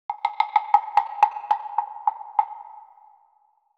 Tension Impact

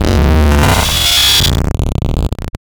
Glitch Fade

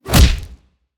Slam Impact

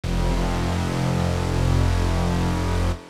Dramatic Brass

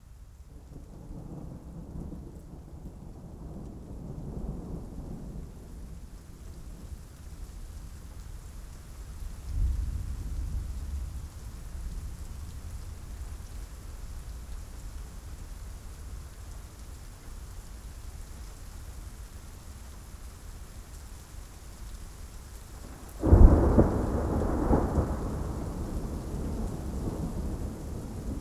Rain Strong Monotone

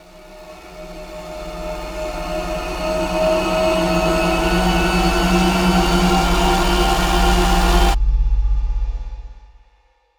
Riser

